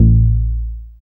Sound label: Bass SH-101